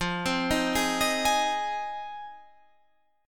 Ab Major, F, guitar chord; arpeggiated; Ab/F; JGuitar

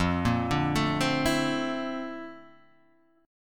A sharp Major Sharp 11th, F

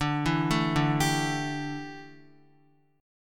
D Suspended 2nd Suspended 4th D down Guitar

